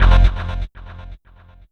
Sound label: DANCE STAB; E-MU Formula 4000 Series Vol. 2